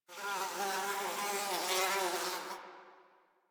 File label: Insect Flyby; Ambience; One Offs; Insects; Warframe; Quests; The New War; Drifter Camp